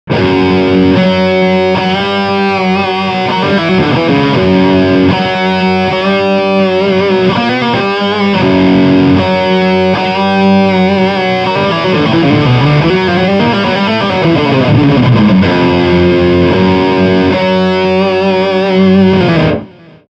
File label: Heavy Guitar Octave